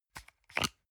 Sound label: toothpaste screw cup open medium